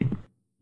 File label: footstep; Ludum Dare 46